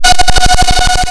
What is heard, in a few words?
science fiction radio receiver static burst